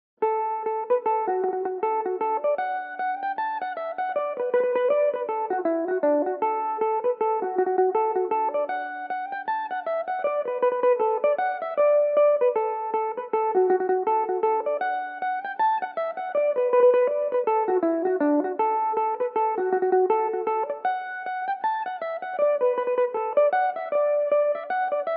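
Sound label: reel D major belmuse, irish mandolin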